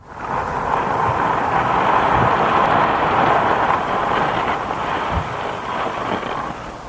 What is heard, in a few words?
Turtle nest waterfall; turtle nests